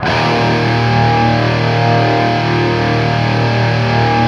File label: 5TH D# looped, Guitar; East West; Steve Stevens Guitar Samples Collection